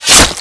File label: Sword Line; nox